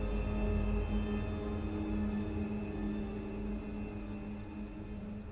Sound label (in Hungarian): Elektronikus dron